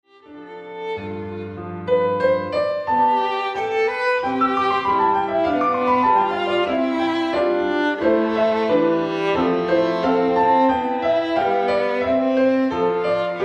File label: Violin